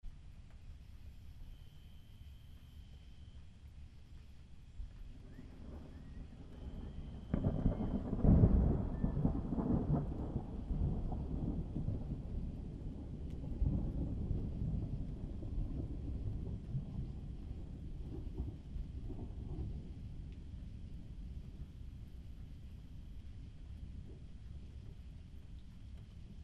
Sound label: thunder clap and nights sounds